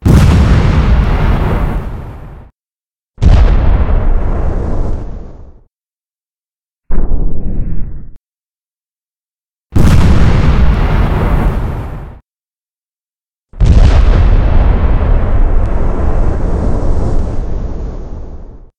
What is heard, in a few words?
3 explosions